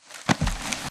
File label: wounded drag; Titanfall 2